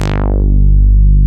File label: MOOG, Source Bass